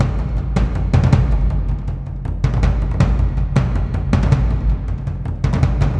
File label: Drums; музыка для фильмов